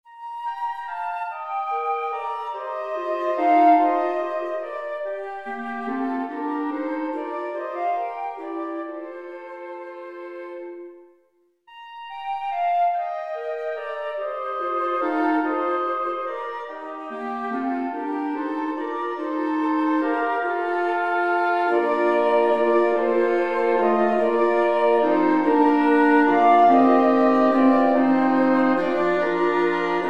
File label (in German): blaeserquintett